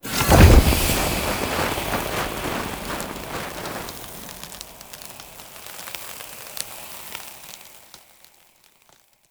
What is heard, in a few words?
Fire Rain Pull; Powers; Fenn